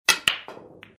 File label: fail